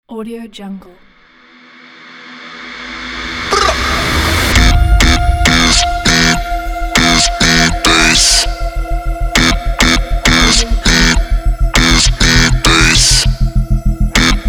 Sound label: bass trap